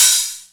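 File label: 909 hi hat open; Goldbaby Tape808 & Tape909; The Tape 909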